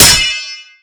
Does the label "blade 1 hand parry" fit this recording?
Yes